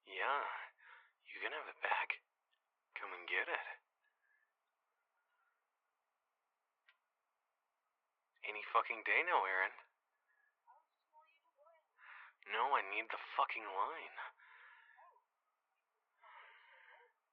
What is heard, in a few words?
Phone